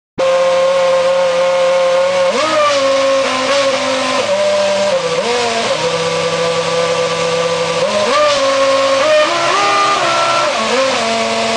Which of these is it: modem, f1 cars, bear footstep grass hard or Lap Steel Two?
f1 cars